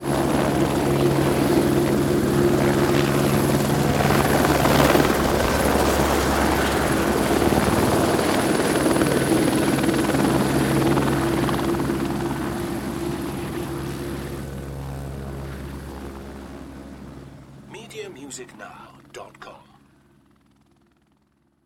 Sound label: Helicopter Hover Fly Away